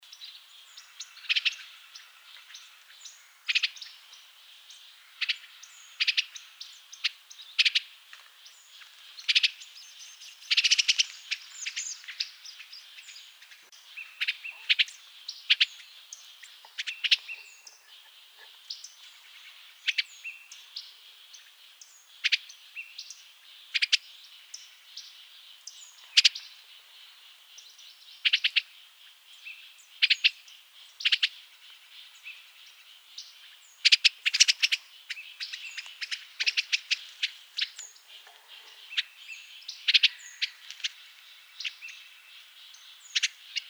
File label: Eastern Bluebird Sialia sialis fulva; thrushes thrashers